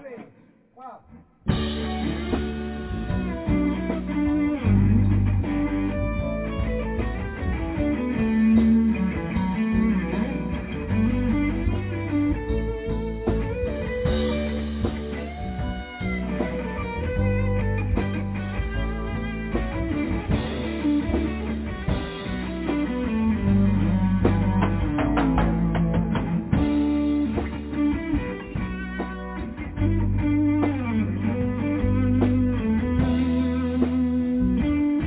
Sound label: solo